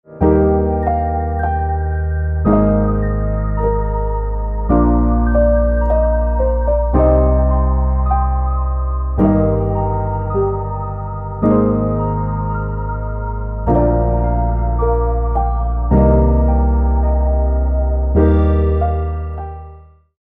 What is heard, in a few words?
D 107 BPM